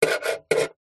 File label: office pen biro draw shape on metal box